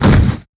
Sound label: body impact wood